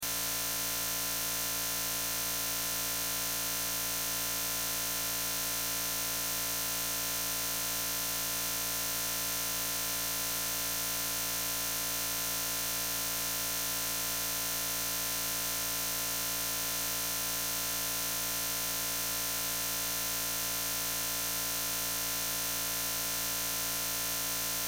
Sci Fi Force Field, Sci Fi Force Field Energy Source Constant Loop